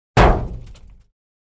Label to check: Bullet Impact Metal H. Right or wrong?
Right